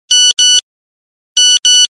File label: nokia 3210 sms tone; made with Voicemod